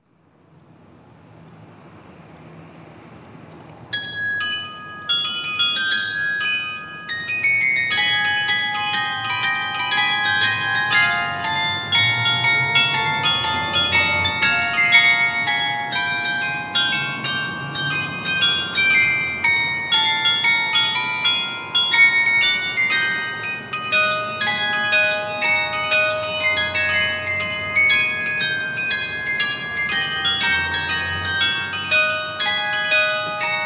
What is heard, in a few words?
carillon